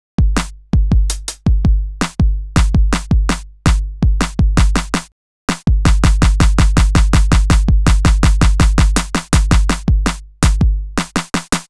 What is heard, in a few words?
Groove Pizza 82bpm